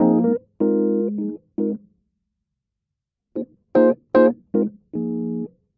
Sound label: 152 mellow jazzy rhodes; KEYS ORGAN PIANO LOOPS